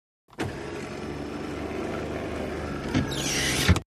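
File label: Electric Sunroof, sunroofs; accessories sunroofs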